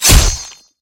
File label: slicing sword strike